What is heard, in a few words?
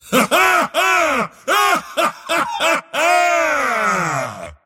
mars laugh; dota